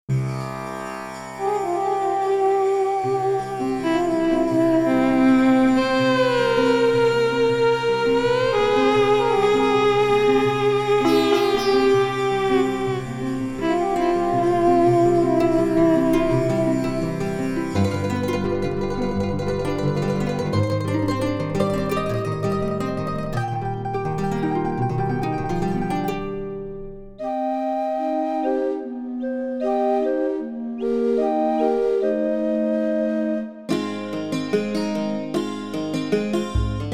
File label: Ethnic Instruments